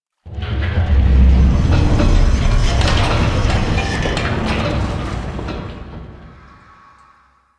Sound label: Metal Pipe One Off; Warframe; Ambience; Entrati